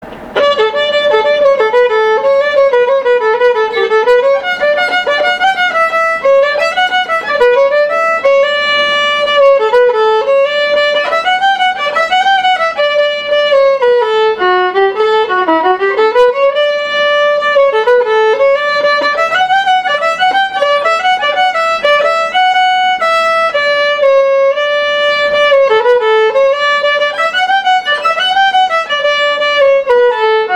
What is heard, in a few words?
Elven Jig (fiddle part)